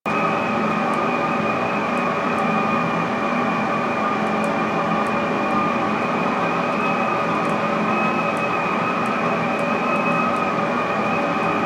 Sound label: Air Vent Loop; Warframe; Ambience; Nineteen Ninety Nine